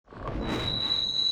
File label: light ball throw; dogma